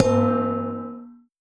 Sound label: gong